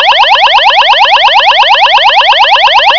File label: warble, alert